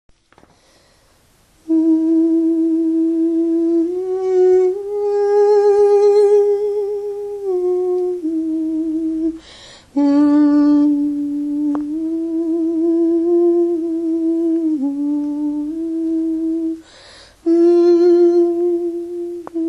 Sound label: alto; oltra a la nanita